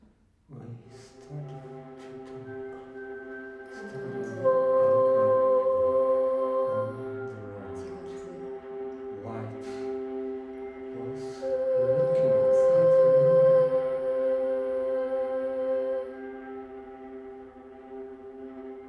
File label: sextet